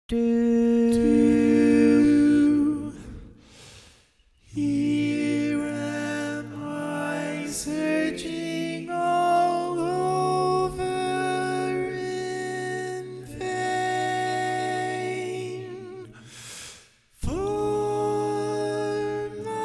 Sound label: tenor